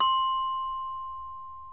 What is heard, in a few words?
music box